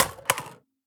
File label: phys mag drop ak poly concrete, magazine drops